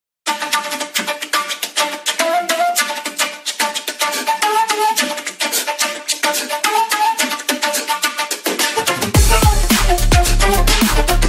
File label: Flute beatbox EDM remix